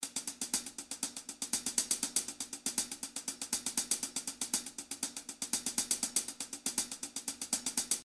HH with random velocity (40-127)

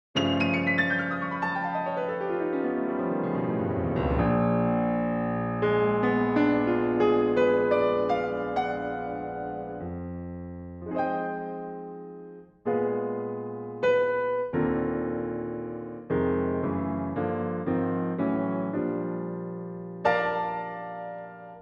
steinway D

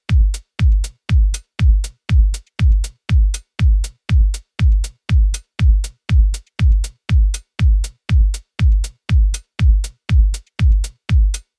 kick loop dry

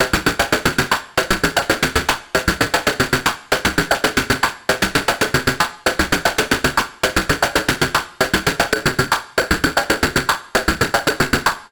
Simple Seq noise mod

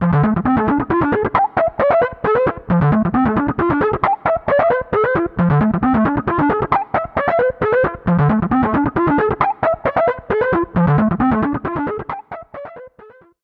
SEQ Primitive Dance

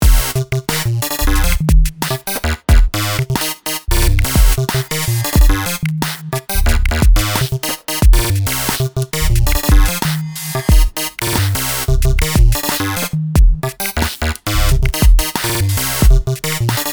random jump drums, sequencer; Numerology; Five12

